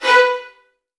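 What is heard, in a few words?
VN FX B2 right; ILIO; Kirk Hunter Virtuoso Strings VOL-1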